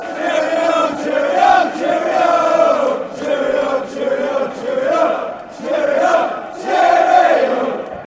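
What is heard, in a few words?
cheerio, football chants; atfc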